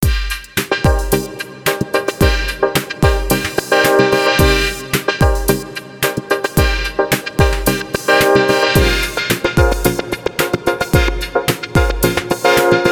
morph; Fast morphing between two CC patterns; 4 CC tracks are used to control several bandpass filters; MIDIbox SEQ

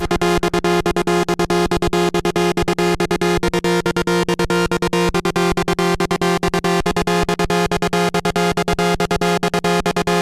trancegate; It rhytmically gates the audio output; The "Trancegate" effect is mostly used with long poly pads and a delay Fx; midibox sid

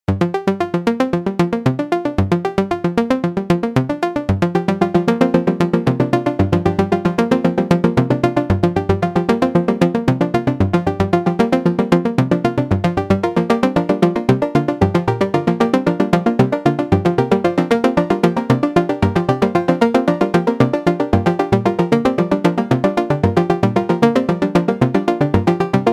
echo; MIDIbox SEQ V4 Lite; the MIDI echo effect repeats notes after a predefined delay. In addition it lowers (or increases) the velocity; Extreme settings have been used so that the effect can be noticed

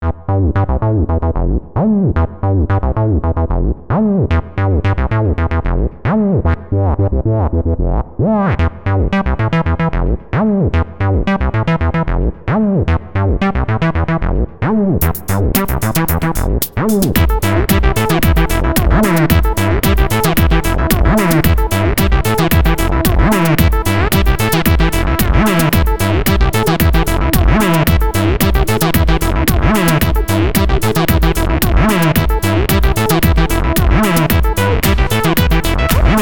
midibox sid v2 bassline

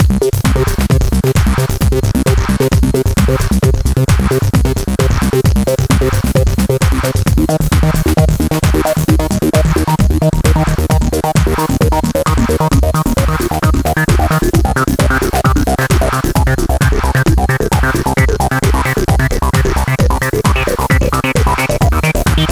arp, midibox sid; kobol; midibox sid v2

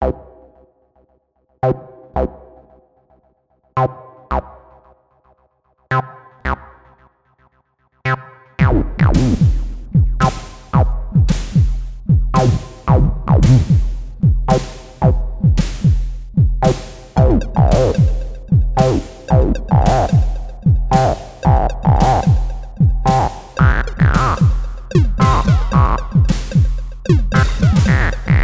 drums, midibox sid; They are played by two SIDs, which are controlled by a single core; the bass drum is very low-tuned; Following drum kits and sequences are part of the preset library

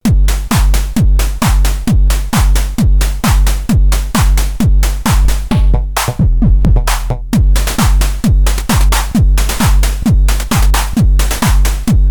drums preset, midibox sid

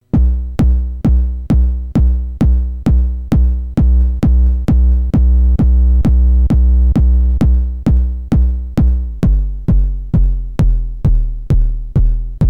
drums bd examples

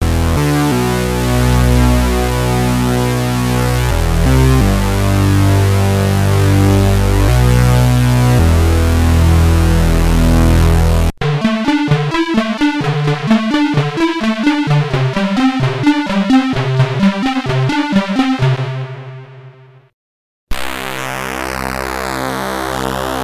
stereo, midibox sid

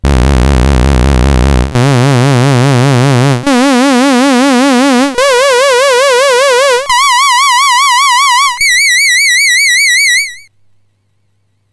lfo lin osc frq mod; midibox sid v2

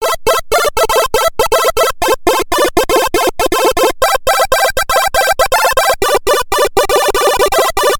arp fast; midibox sid v2

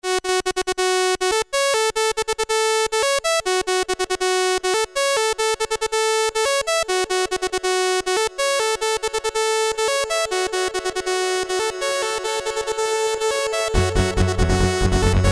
midibox sid

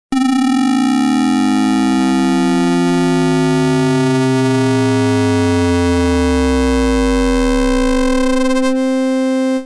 osc ring; OSC3 is ringmodulated with OSC1. The OSC1 frequency is slowly increased; midibox sid v2

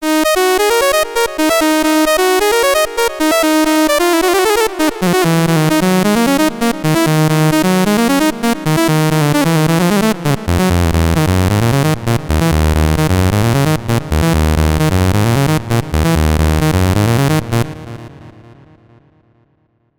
osc transp, midibox sid; decreased to -12 (-1 octave), and later to -24 (-2 octaves)

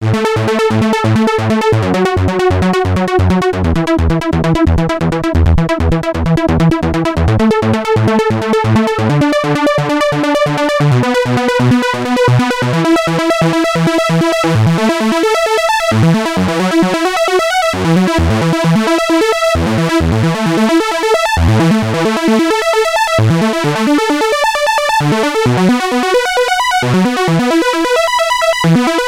arp slow, midibox sid

